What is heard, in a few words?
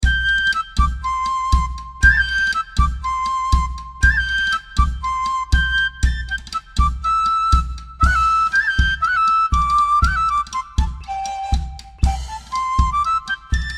background